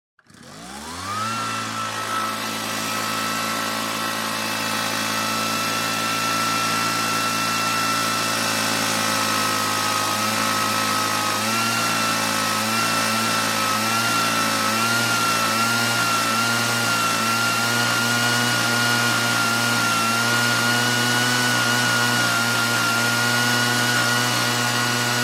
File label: Leaf blower; SOUND EFFECTS1